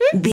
voice fun small character emote surprised

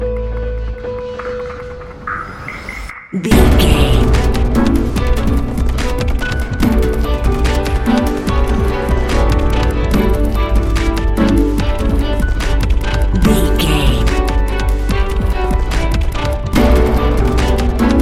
A cool piece of electronic music

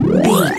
retro powerup collect

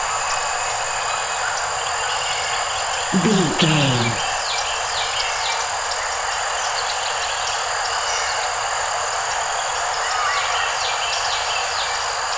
birds insects farm night loop

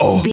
gorilla hurt

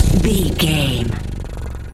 cat purr

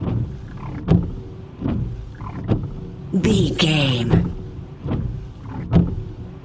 car windscreen windshield wiper slow loop

